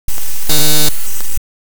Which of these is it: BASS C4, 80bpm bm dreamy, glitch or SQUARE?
glitch